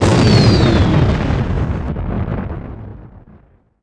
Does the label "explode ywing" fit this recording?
Yes